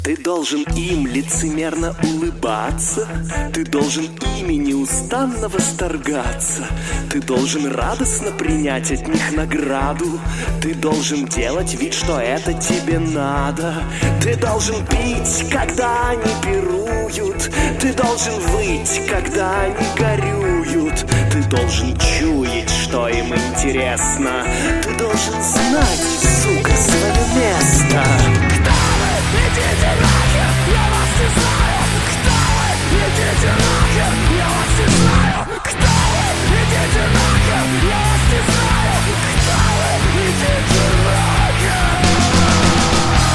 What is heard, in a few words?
corp melody